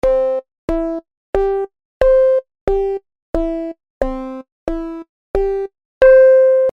Ein aufgelöster C-Dur Akkord; der unbearbeitete Audiotrack; Unspektakulärer geht es nicht; Native Instruments Molekular; elektronischer Minimal Music

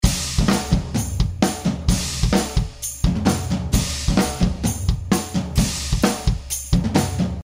Audiodemo mit gestoppten Becken; Steinberg Groove Agent 4

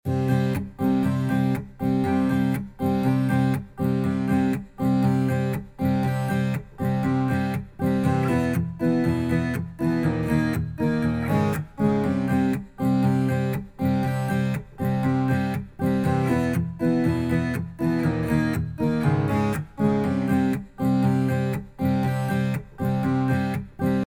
Klangverfremdungen, die aus der Gitarre ein sanft schwingendes Instrument beinahe ohne Attacks machen; Zynaptiq Unmix Drums

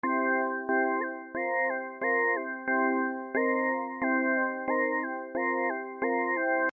Mein aufgelöster C-Dur-Akkord; Pitch-Quantisazion; Native Instruments Molekular; Plagiarism

